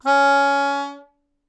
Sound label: Melodica Long, Long Notes Chromatic